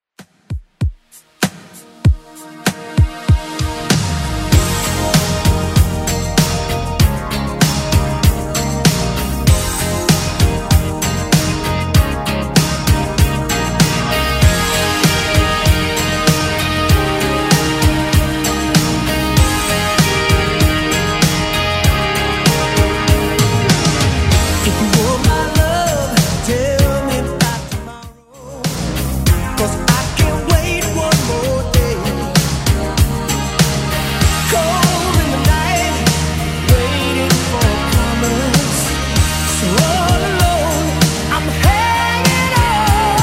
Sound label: 80s 97bpm; Redrum